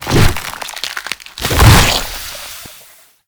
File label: Rolling Rot Start; Warframe; Abilities